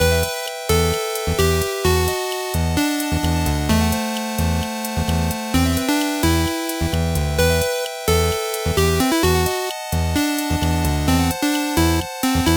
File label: Area 1 Castle Music; Bebop and Tempo